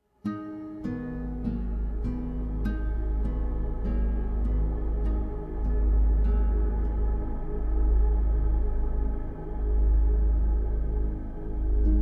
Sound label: Ambience; assassins creed ii